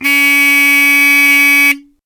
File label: Hootronic Tone